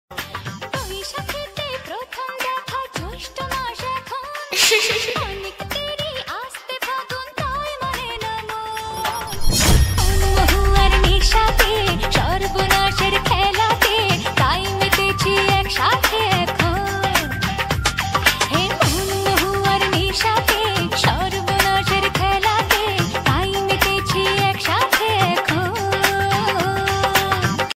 bengali ringtone

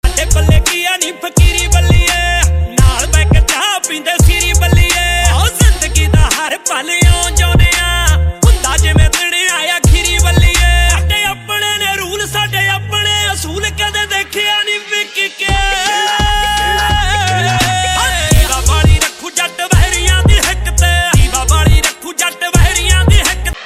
punjabi ringtone

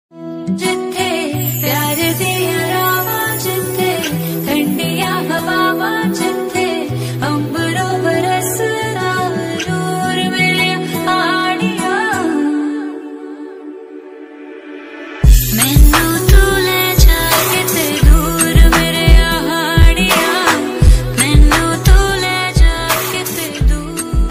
female, punjabi ringtone

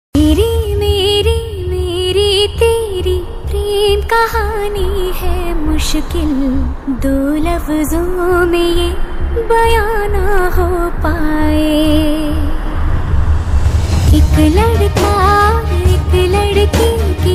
female version hindi ringtone

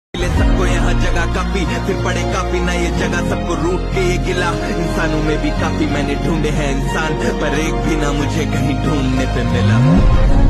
uttrakhandi ringtone

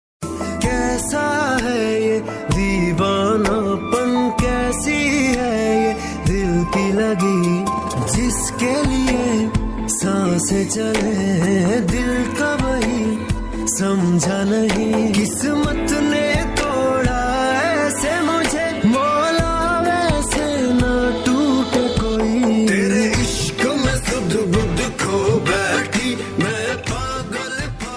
Ost pakistani ringtone

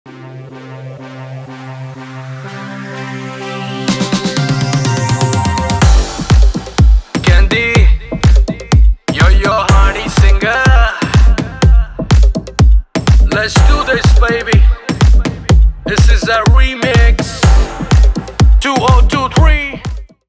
BGM ringtone

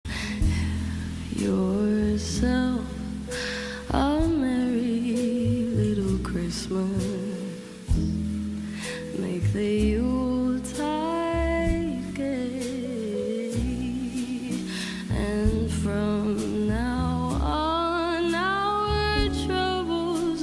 Christmas ringtone

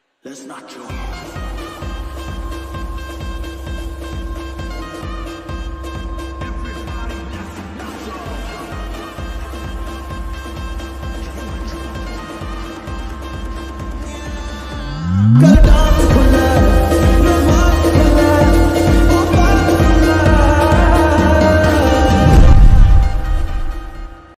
lofi remix rap ringtone

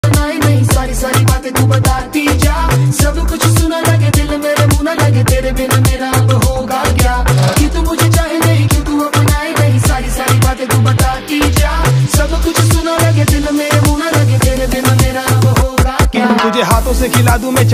hindi rap ringtone

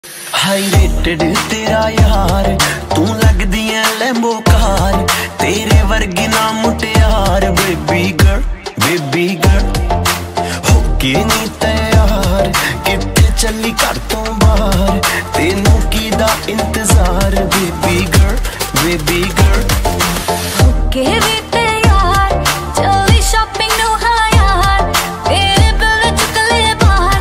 punjabi ringtone

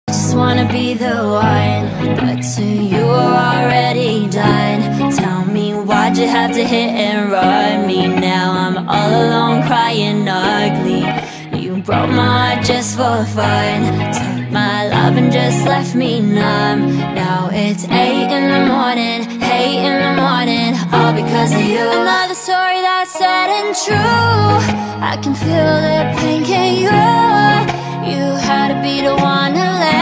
korean ringtone

